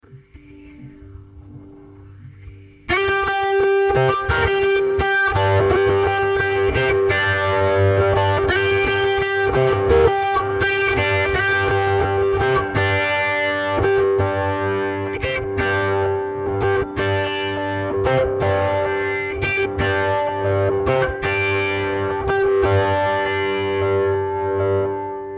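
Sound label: G 170 guitar riff trash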